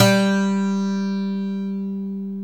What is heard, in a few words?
SAZ G 1; Soundscan vol.53; Mediteranean Traditions; AKAI